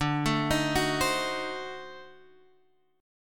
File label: D 7th Flat 9th D down Guitar Half Step Down